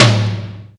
tom, Yamaha TG-500

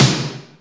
SNARE, Alesis D4fx; Drums

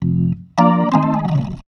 B3 FALL F, PLAYED

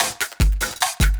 LOOP SD, Drum & Bass, 150 BPM; Ueberschall; Drum & Bass Resonance

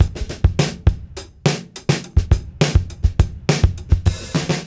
Beat Loops; Zero-G; Monster Beats

